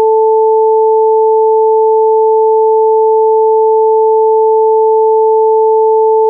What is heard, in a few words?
a440 and one partial